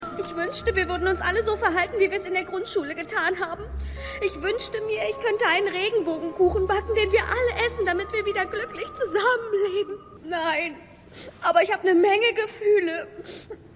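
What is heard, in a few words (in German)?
Weinendes Mädchen; Girls Club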